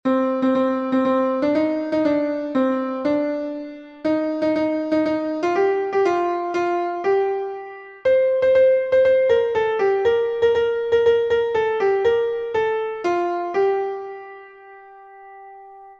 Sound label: a minor excursion